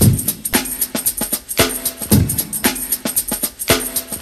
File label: break loop